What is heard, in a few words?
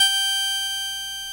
ORG VOX, Continental; Roland LCDP09 Keys of the 60s and 70s 1